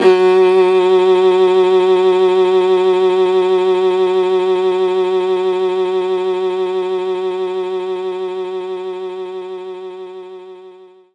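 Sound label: Viola F sharp 3; knights try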